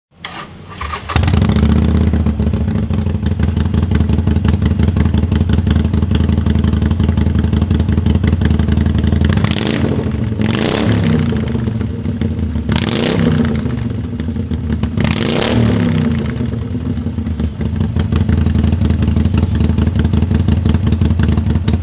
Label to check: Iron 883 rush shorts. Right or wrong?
Right